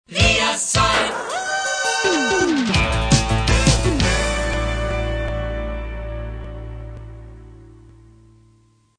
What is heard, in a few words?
jingle